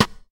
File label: classic clear hip hop snare drum g sharp key